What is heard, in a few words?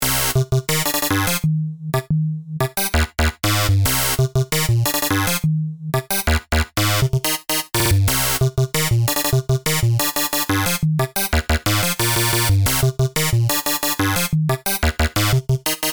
reset step length, sequencer; Numerology; Five12